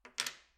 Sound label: domino